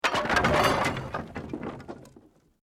Wood Collapse

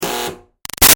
Buzzer Door Junky Short; Alarms; Electronics; Alarm Sound Effects

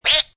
Cartoon Quack, Funny, short and loud duck quack; Comedy/Cartoon

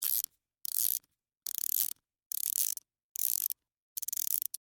Wind Up Toy Long, Clicks; Foley; Wind Up Toys

